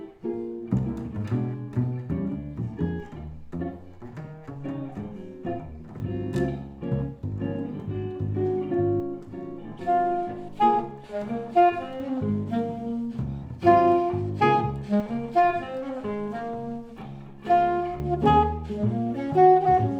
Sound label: jazz